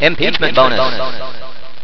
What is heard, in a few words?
cheer; mechamoid